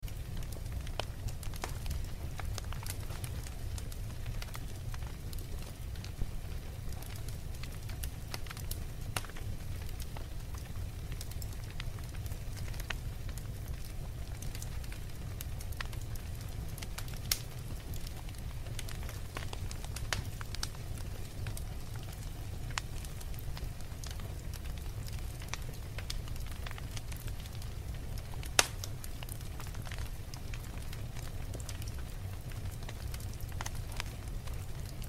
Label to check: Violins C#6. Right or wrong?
Wrong